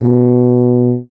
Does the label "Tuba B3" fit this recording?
Yes